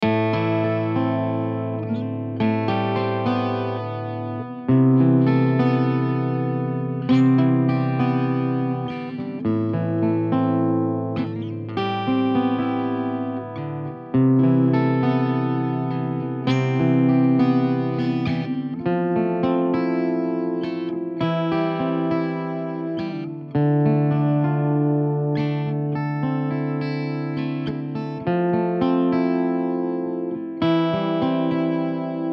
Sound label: Blues